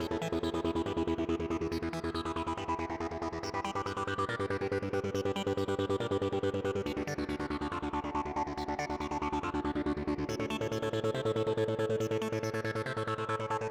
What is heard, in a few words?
Syncer Arp WET 140BPM, Eminor; Melody & Synth; Vengeance Samples